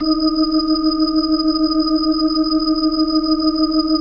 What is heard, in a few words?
EB4F, B3